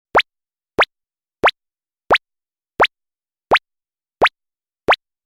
punipuni

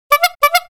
rappa

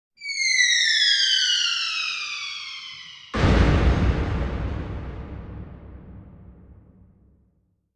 hyudokan

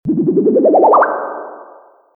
fuyuuon

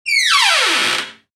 tobira

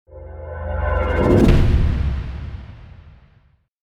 mahou

